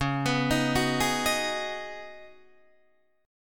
C sharp 7th Suspended 2nd Suspended 4th C sharp down Guitar DADGAD